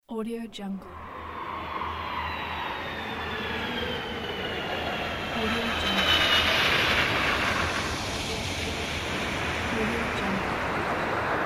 plane passing by; erhanaarassfx

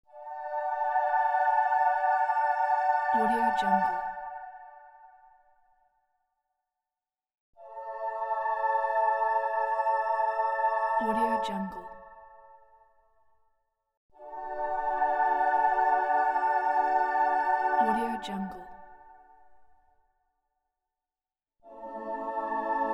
heaven angel choir

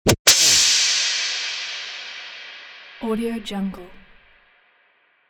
cinematic impact whipping

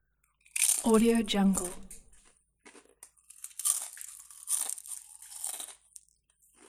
eatting chips

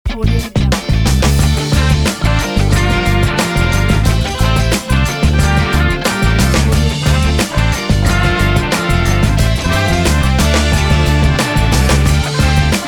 soul logo

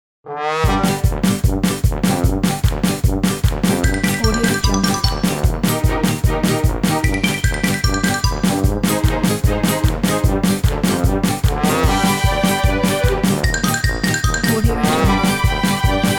in the circus

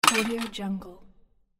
junk dropped into bucket

